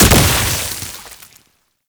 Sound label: Archgun Proj Explosion; Warframe; Weapons; Archwing; Tn Nokko Archgun